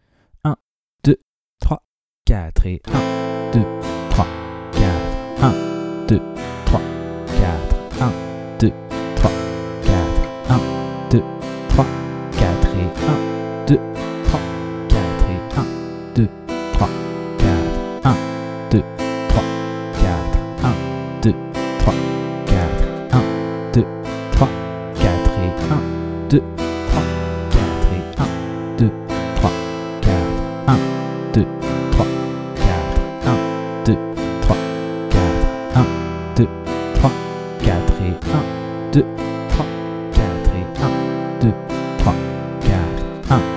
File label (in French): rapide travailler la rythmique; De 0 à vos premières suites d'accords